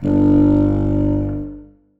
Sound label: contrabassoon g1; Sonatina Symphonic Orchestra